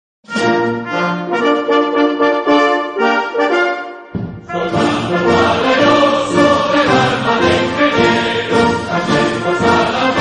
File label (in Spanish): Himno Ingenieros